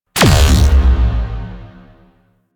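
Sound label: Grenade Launcher Projectile Explosion; Warframe; Weapons; ThanoTech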